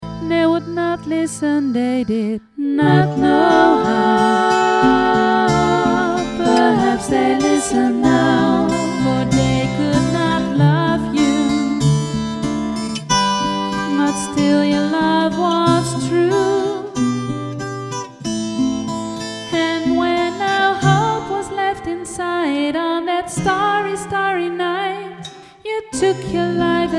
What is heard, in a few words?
Lead; Leadzang